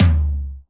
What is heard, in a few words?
Tom, DIGITAL KIT